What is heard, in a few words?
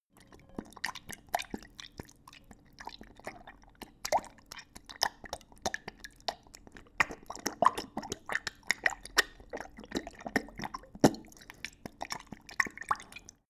dog drink